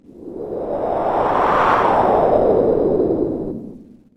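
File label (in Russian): ветер одиночный близкий мягкий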